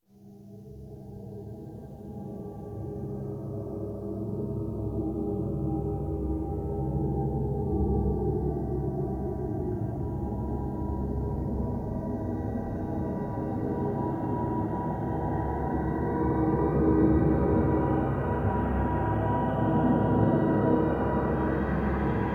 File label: Disturbed Soundscape Looping, horror surreal